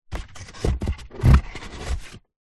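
foley cardboard box fast food empty flaps movements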